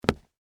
Combat Boots Single, Footsteps, Combat, Boots, Single, Step, Wood, Surface; Blast Wave